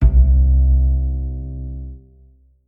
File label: Bass Note; Bendy: Lone Wolf